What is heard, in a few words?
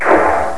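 weird; JOSEPHIN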